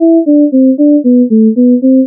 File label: sad original